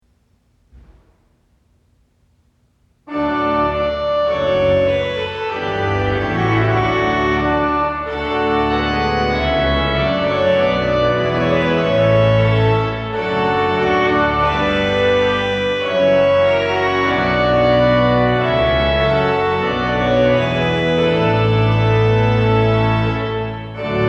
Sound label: Trumpet Tune in D